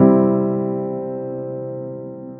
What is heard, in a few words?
chord C